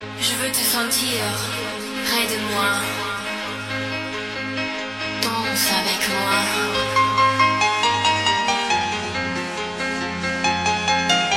french love

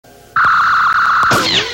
Phazers

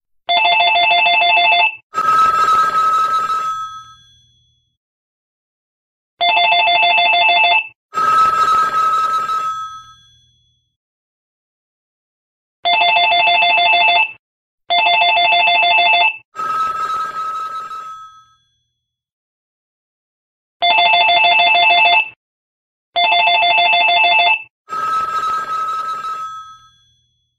Classic office ring

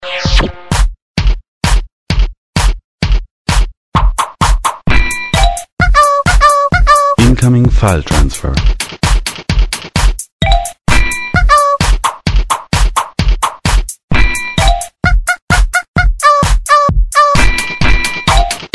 new Crazy Trap

